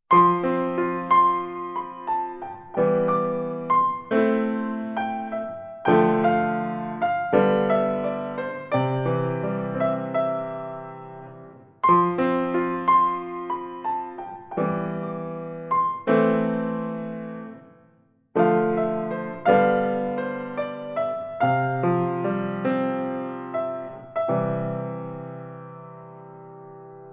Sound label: reverb piano Keys